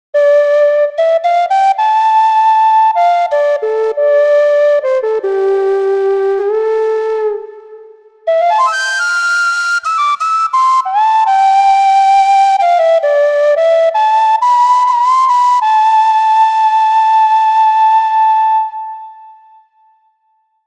Bamboo Flute; Kontakt Wind Paks

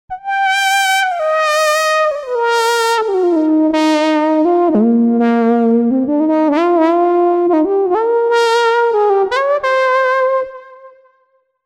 EWI Lead; TURBO VL

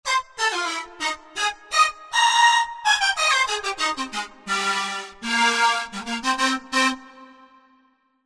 Breath Tb; TURBO VL

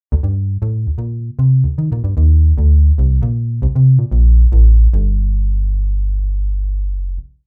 Ac Bass; TURBO VL

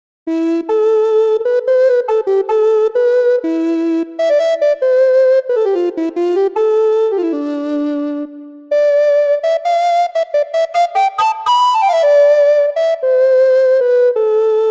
Recorder Tenor; Kontakt Wind Paks

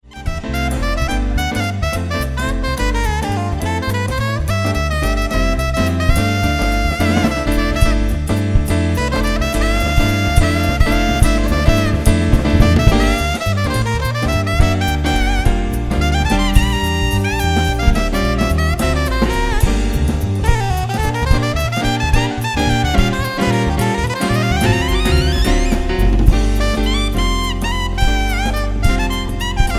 Solo

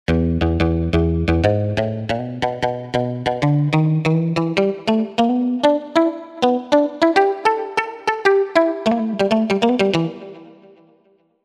Mute Gtr; TURBO VL

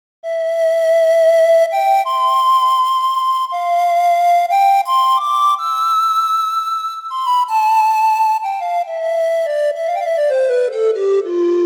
Pan Flute; Kontakt Wind Paks

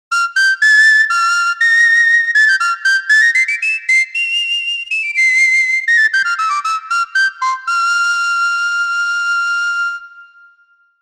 Ocarina; Kontakt Wind Paks

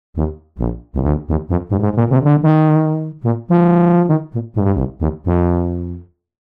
Tuba; TURBO VL

